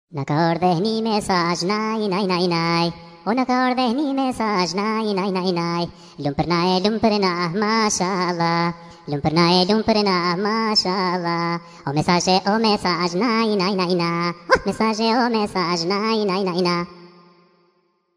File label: sms tone ringtone